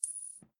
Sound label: Flip Coin